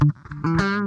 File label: THUDDY, Funk Guitar; 90 s Sample CDs; Zero G